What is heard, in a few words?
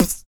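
vox percussion, Vocal Planet Jazz FX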